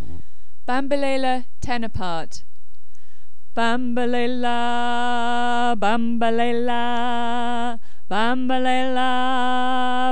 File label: Bambelela tenor